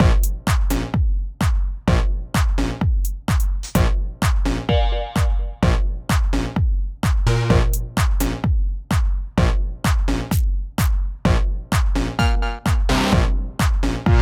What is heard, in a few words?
drums study